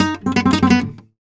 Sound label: PICADO GTR, Flamenco; Best Service ProSamples vol.46; AKAI